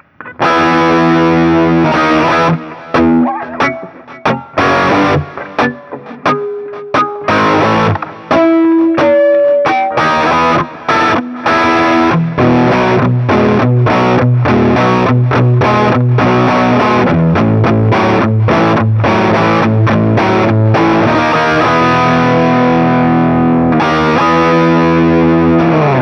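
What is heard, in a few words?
Guild SF III Super Tweed, guitar; Westerly-made Guild Starfire III from 1997; Riffage; SuperTweed / RockyHorror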